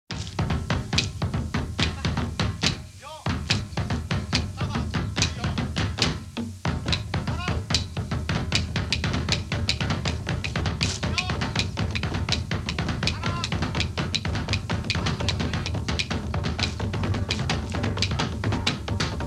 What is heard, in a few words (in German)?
polinesische trommeln; Suedsee